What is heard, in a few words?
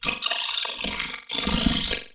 ghost armor die; propack; magic